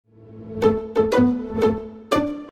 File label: birthday reminding song